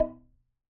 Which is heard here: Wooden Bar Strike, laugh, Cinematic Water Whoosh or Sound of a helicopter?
Wooden Bar Strike